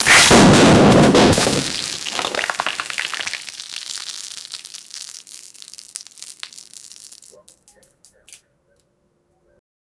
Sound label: person crushing a bath bo